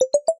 Cablegram, notifications

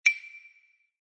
Woodblock

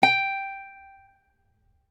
dial tone guitar pound; Oppo Neo 5

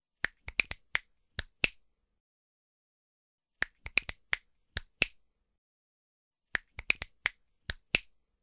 Snap; Oppo Find X903

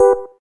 gayageum, keytone

